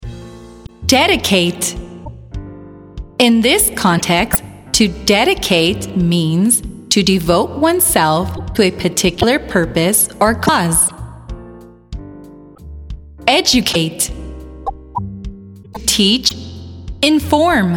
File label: voc